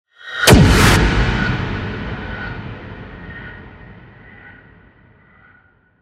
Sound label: club impacts sound